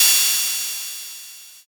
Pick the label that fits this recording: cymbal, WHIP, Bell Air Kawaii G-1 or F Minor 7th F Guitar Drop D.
cymbal